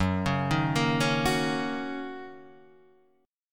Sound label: Fsharp 6th down, chord